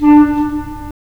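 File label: wind clarinet; LA Composer vol. 3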